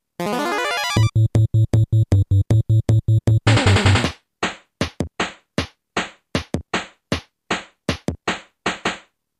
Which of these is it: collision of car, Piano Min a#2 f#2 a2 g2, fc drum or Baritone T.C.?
fc drum